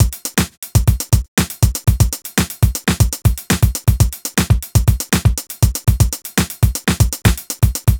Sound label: 120 Drive beat basic, Nu Jazz House; 120 Beats; PowerFX